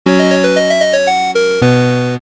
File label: Level Up; habitica; watts Theme